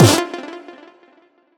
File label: combo power; quatuor